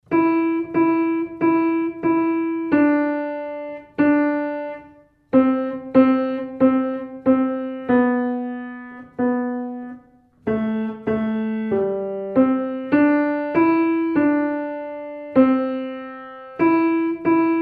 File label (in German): Choral, Klavier